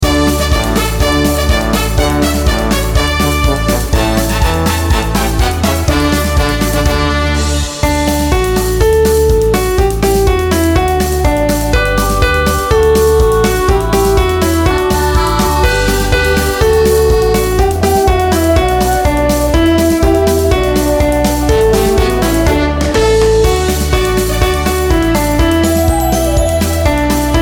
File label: karaoke